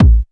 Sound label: dance 1, bassdrum; Ueberschall; Techno Trance Essentials; DRUMS; BD DANCE1